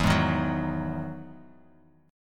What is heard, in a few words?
E Major 9th E, Guitar, Open F; strummed